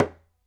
percussion; percussion oneshots; bespoke - Floral v.1

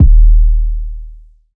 Trap bass, EDM

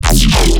bass Blob 100 E; Ganimed; base pack

